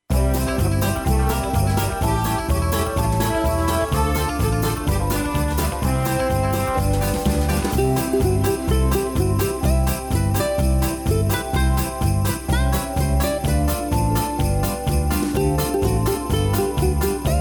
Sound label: with bells backing track, square dance music; Silver Sounds Records